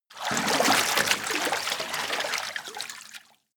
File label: water strong